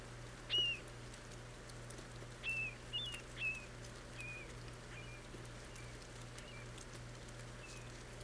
Marbled Murrelet, Brachyramphus marmoratus; alcids

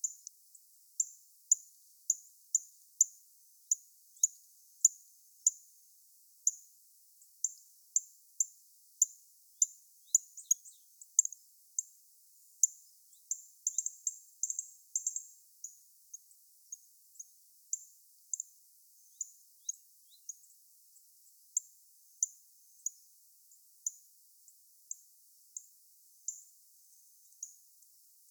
Northern Cardinal, Cardinalis cardinalis; cardinals tanagers grosbeaks

